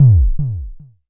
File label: Jomox MBase, drum machine; Jomox MBase 01